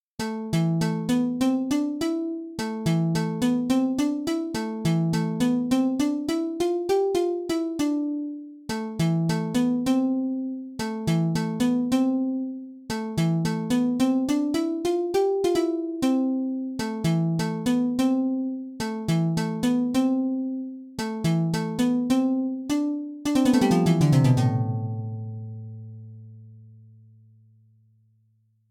harp; aas

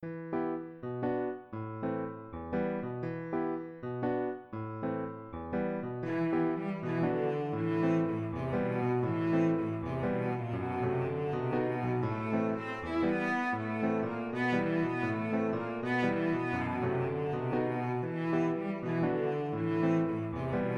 Emotional Cello fortepiano

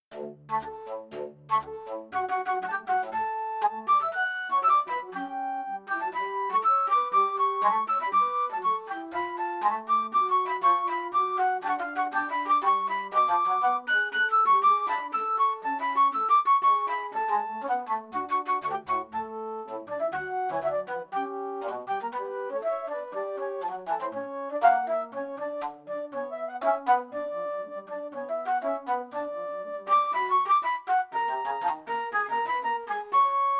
flutes; Flute Flight; Orange Tree Samples

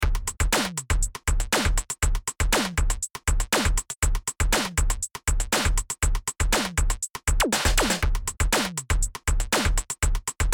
drum satt

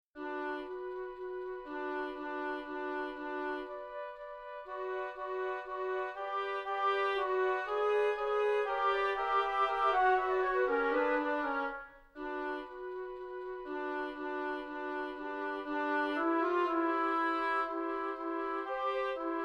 Woodwind; Sonivox; Holzblasinstrumenten

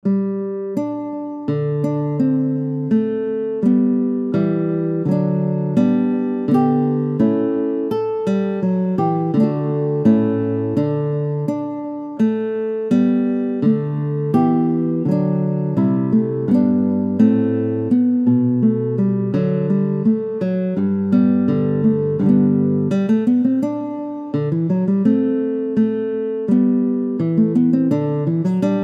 honey nylon; orange tree samples

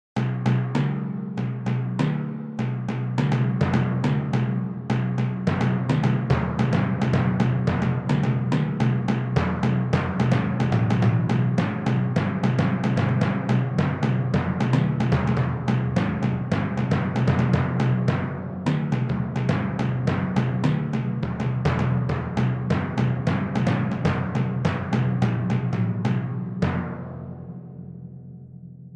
dees perc, deesser; XILS; Xils Lab

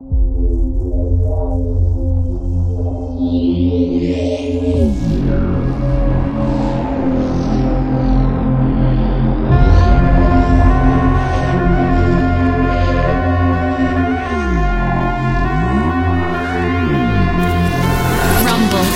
rumble, Rumble Bass Synth; testbericht-rumble-bass-synth-von-uvi

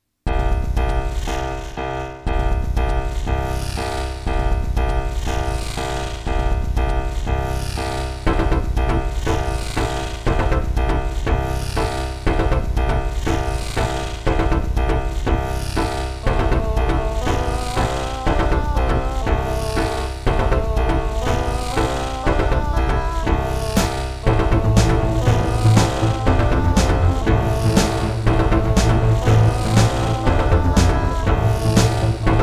dx7; arturia; v collection 6

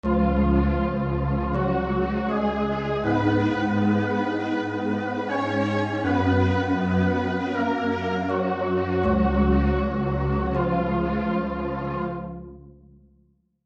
orchestralpad; Titan 2; Synthesizer Library; Best Service

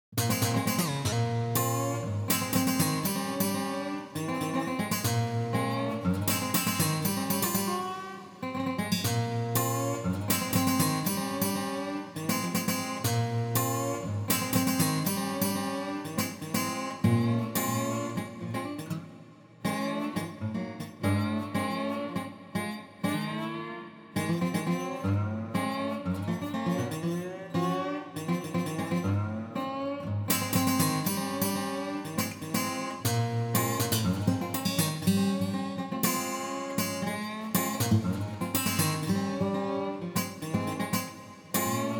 crunch; rev ocean; Rev Ocean Plugin von Arturia

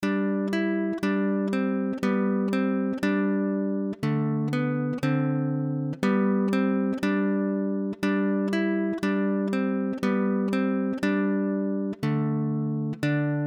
guitar; StringStudio V2 von AAS

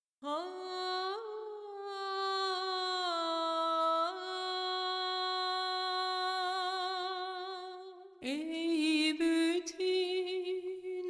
turkish female voices; Ethno World 6 Complete

